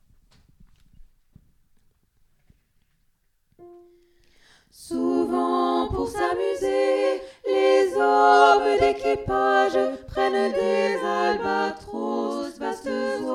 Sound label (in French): l albatros tutti